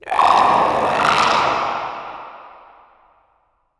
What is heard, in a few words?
Bahro Timid